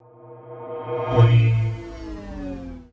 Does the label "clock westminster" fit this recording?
No